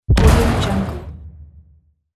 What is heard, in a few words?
a gun